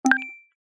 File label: mail sent